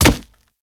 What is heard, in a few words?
Infested Charger Step Default, Footsteps